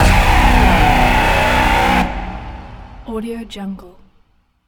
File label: braaam strike; esmteam